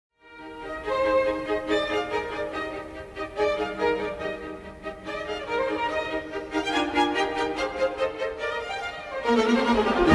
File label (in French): concerto trompette; haydn